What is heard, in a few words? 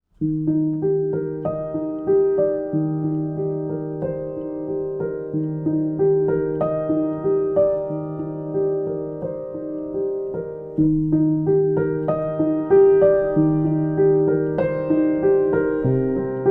Piano